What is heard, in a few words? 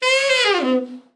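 TENOR overblow FALL, Sax; Giga Samples Collection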